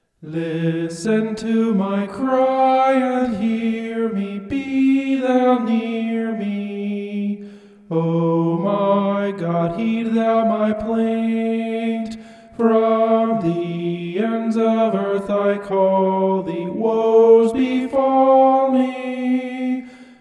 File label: vocal homoph; psalm 61